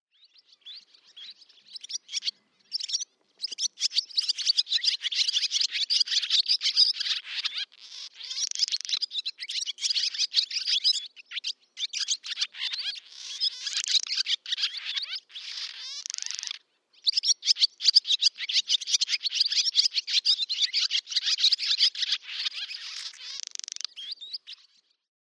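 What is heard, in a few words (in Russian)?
Derevenskaya lastochka, barn swallow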